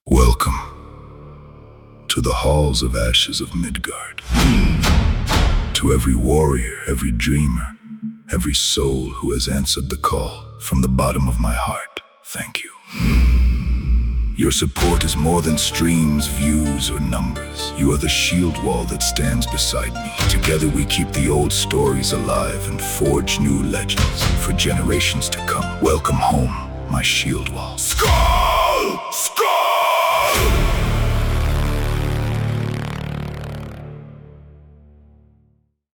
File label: gate ambience